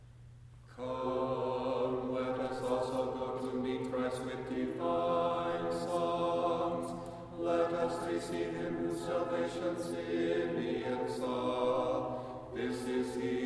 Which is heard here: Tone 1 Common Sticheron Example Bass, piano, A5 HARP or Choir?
Tone 1 Common Sticheron Example Bass